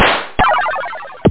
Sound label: gunsho; kinghigh